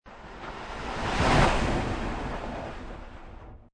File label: Skiing Snowboarding Snowboarder Or Skier Pass By Fast Right To Left